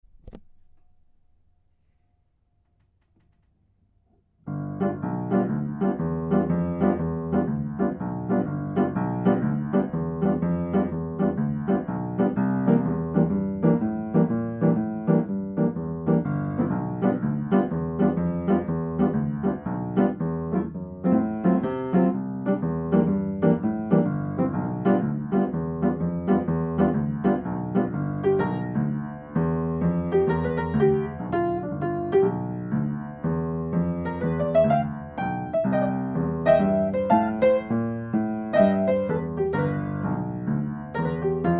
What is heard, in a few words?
walkin blues